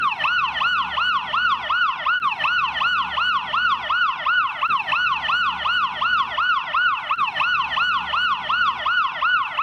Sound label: firetruck; custfire